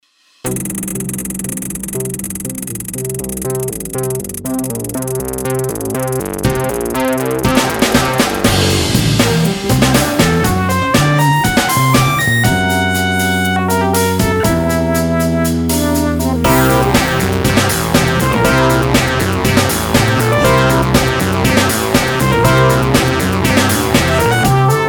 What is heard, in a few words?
Funky News